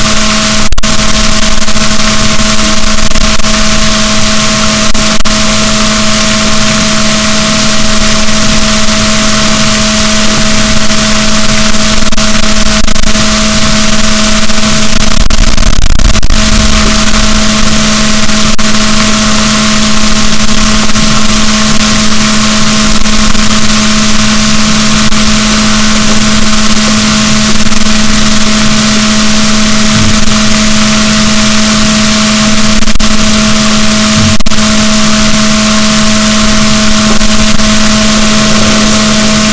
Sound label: rorquals negatif, boat